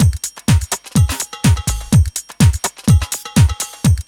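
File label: drum loop; X-Static Goldmine 3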